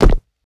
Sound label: land rubber, footsteps; player